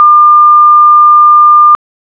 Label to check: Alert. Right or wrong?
Right